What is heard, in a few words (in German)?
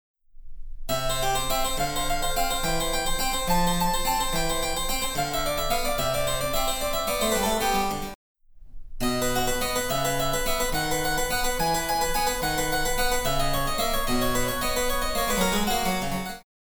Cembalo; Mark Lindley WTC 1